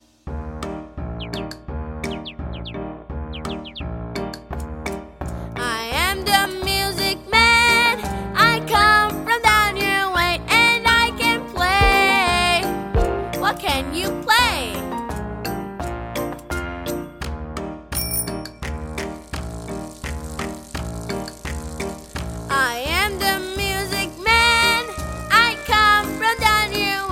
영어남, 아동, 노래, I Am The Music Man; 남자

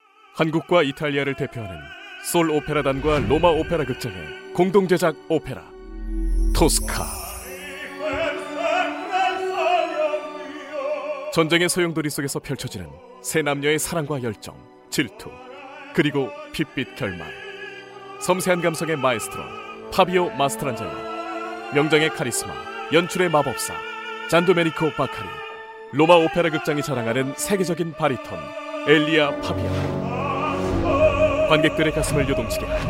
오페라토스카, 남자

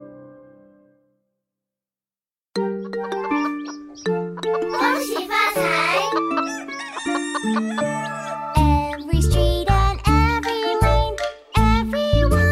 영어여 동요

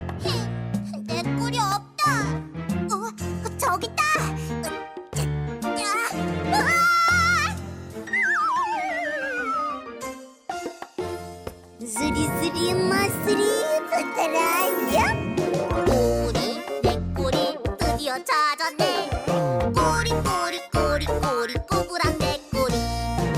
어리숙 귀여운 남아 노래 성우

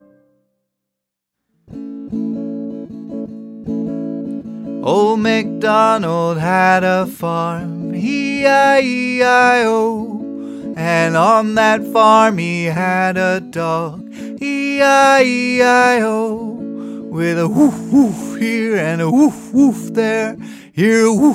영어남, 동요, Old Macdonald; 남자

